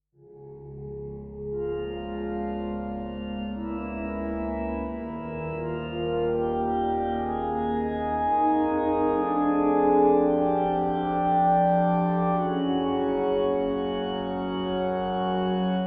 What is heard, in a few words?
Pipe Organ; labs pipe organ; Slow Mo